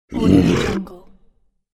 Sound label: beast attack growl; game